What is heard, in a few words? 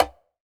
CONGA MUT; EdgeSounds; Drum Mashines; R-8 DRUMS